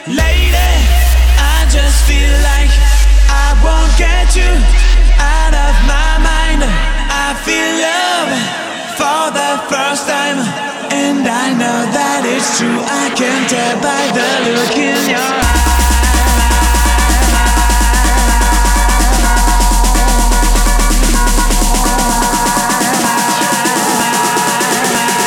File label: Mashup